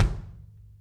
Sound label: KICK